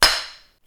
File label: foot